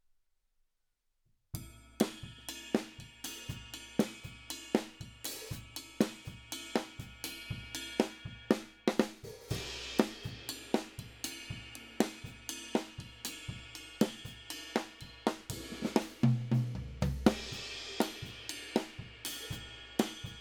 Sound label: Drums